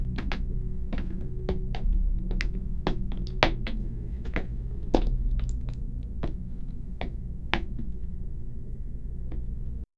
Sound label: monster footsteps